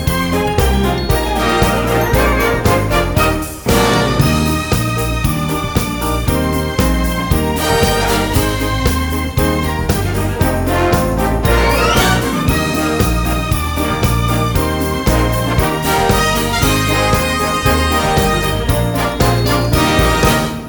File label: Jingle Bell Medley Jingle Bells no vocals; Sawgrass Elementary